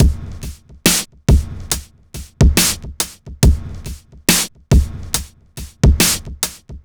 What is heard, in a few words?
Electronica Drumloop; Drums